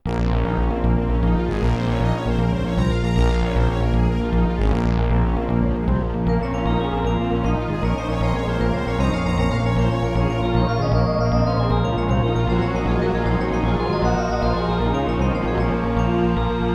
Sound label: AW744L